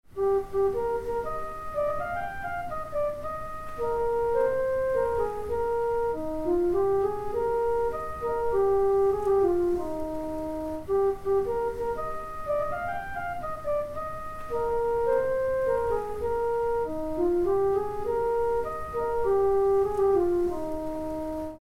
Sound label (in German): Wiener Flöte